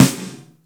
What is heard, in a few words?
METAL GATE, SNARES; InVision Interactive; Lightware VOL-1